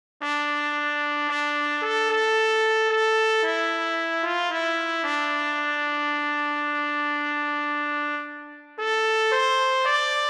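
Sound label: solo, Trumpet; Scarborough Fair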